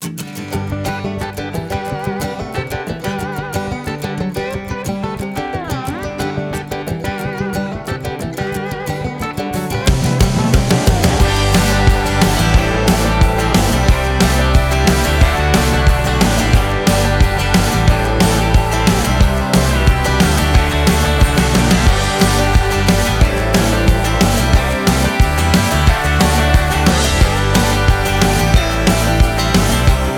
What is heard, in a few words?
Kicker Country